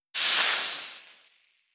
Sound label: Nature; Legend of Keepers